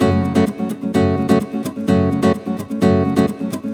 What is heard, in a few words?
Nylon Guitar - F# min, 128BPM; Vengeance Samples; Special Sounds; Nylon Guitar Kit